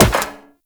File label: Wall Jump Metal Perforated; Warframe; Tenno; Wall Run